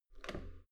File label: metal door heavy handle up soft